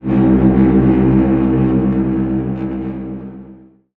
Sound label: giant takeoff